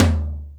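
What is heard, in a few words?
Tom Floor Studio, Alesis D4 drum machine; 300 Drum Machines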